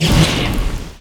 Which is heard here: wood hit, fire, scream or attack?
attack